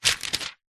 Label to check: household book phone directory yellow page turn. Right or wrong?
Right